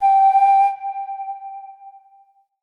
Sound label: Flute G; Player Instrument